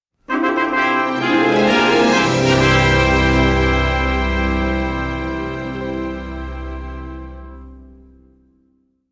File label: Fanfare; Days End